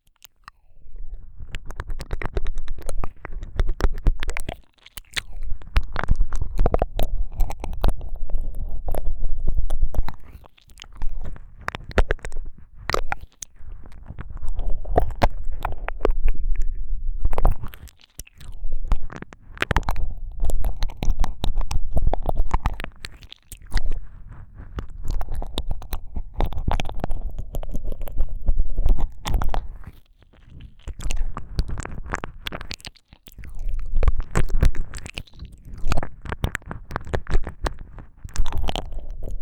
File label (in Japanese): ぞわぞわゾクゾクする耳舐め